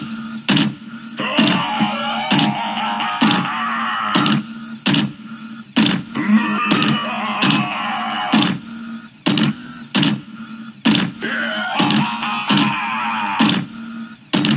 robot

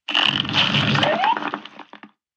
Potato Rise Ground; veggies

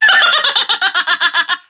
vox laugh big

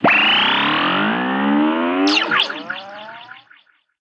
Carrot Mind Meld Start

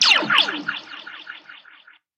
Beam Fire

